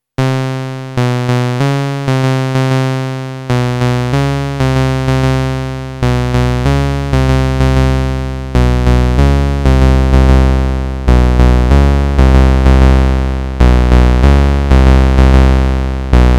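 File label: Erica Synths DB 01 Sub Oscillator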